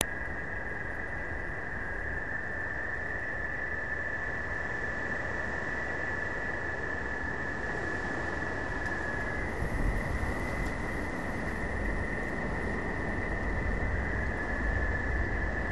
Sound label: thunder